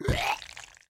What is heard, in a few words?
hit, rotter; monsters